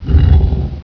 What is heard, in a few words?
panel; CAESAR3